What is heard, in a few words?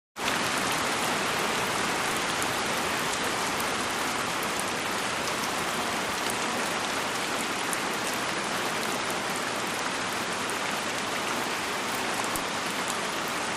Light Tropical Rain